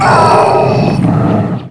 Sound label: death; vortex; terminator